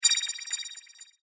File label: Wizard Casting Spell, magic sound effect; fantasy sound effect; Magic Sound Effects; role playing games, magic videogames